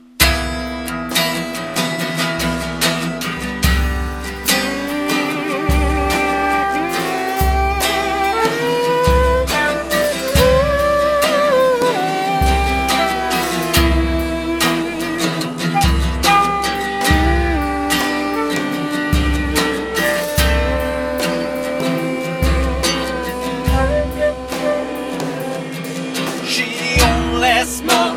drum

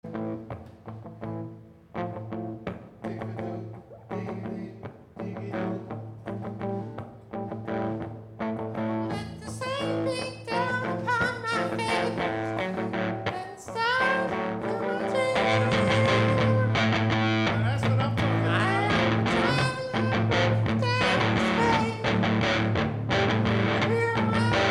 falsetto

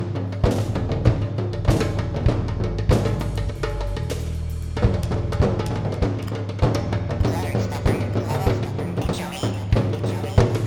Drumming

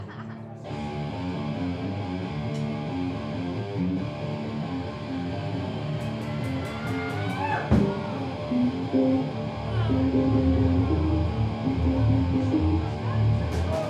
howl

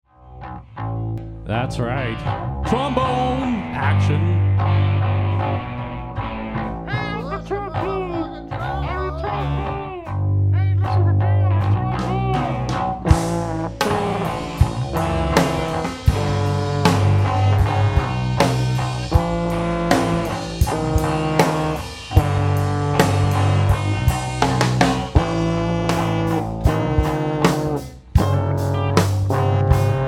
trombone action anthem

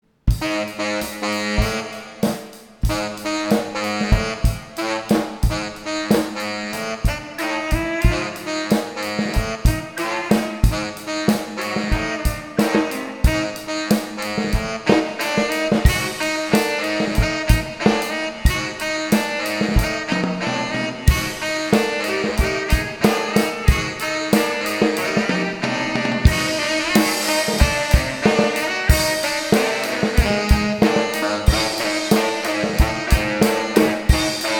Sax Looping

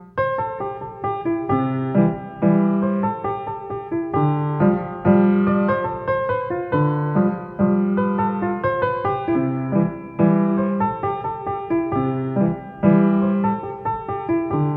a quick woodland waltz

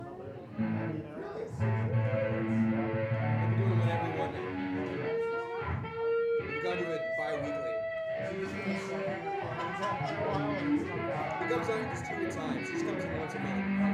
guitar warblings

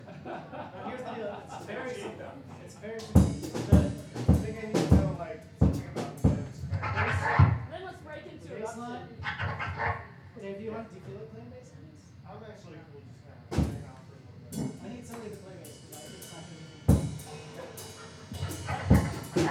scratching, Jam

